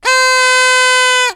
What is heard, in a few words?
party horn